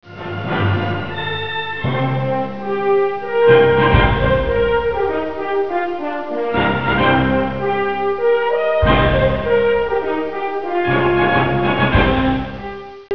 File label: horn sound